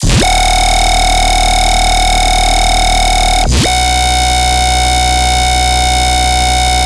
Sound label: Bass Loop D# 140BPM, Dubstep